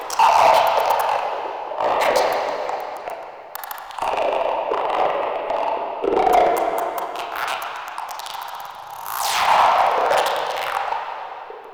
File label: Sweeping Crackle Loop; Warframe; Shadowgrapher; Interior